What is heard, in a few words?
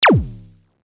Retro Shot Blaster